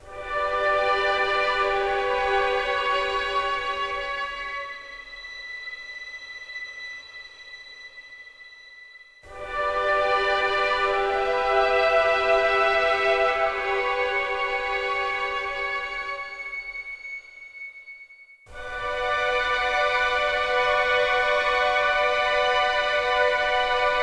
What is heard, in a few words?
Mobile Home - Ambient, Strings Stem; PS4 PS5 Switch Windows Xbox One Xbox Series XS